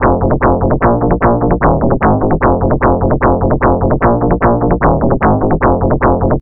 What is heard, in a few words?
seqrep techno; nyquist